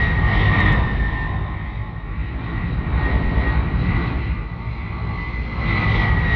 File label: energy core loop